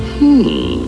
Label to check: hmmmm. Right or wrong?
Right